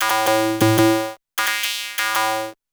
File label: Synth G#m, 175 BPM; loops; CHIPSHOP DELUXE